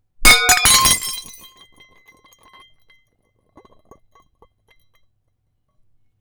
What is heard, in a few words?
Plate Shatter Break